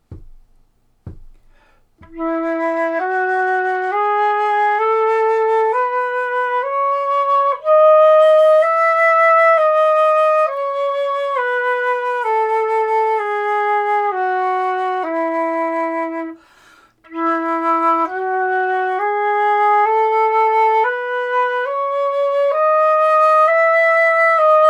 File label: E major c minor a major, flute